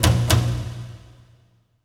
STRINGACCENT, Orchestral Loops

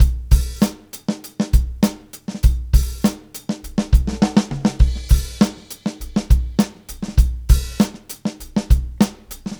Live Beats, R&B Drums

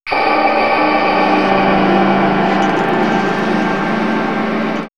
Swirls Alien, pads; X-Static Goldmine

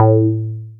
BASS; X-Static Goldmine 1

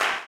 CLAP, blues & soul; ILIO; Vocal Planet

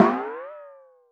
timp; musicradar; cartoon caper

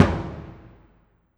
Bass Drum, Orchestral Percussion One Shots; Sonic Foundry (Sony Creative Software); Orchestral VOL-2

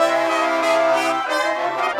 Brass Winds, Orchestral; Sonic Foundry (Sony Creative Software); Orchestral VOL-2; Modern 16

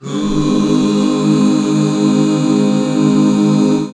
HAL AB, BACK CHORDS, Roland; Sound & Vision - Gigapack II CD 1

